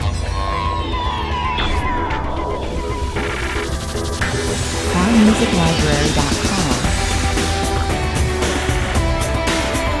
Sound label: fire burn (alternative melody) 114 bpm, A Driving, energetic theme perfect for any modern show opening